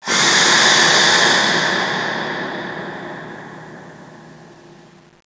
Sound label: scream; cstrike